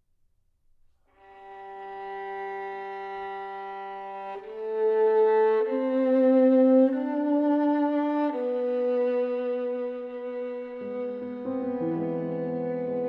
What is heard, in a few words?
sonata for violin and piano interlude